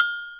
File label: AGOGO HI, PERCUSSION; Crypton - DrumBase5000